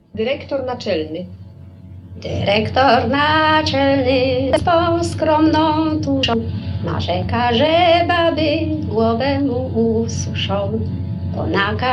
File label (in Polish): Dyrektor naczelny melodia oryginalna